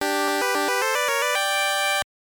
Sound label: Jingle, soundtrack; pachi slot kids 3; gb